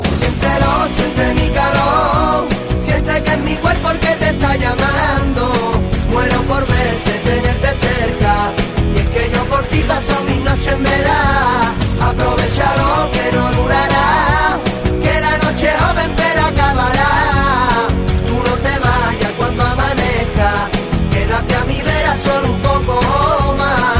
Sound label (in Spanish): fondo flamenco